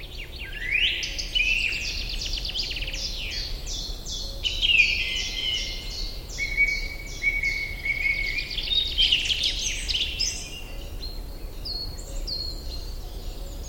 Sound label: willow warbler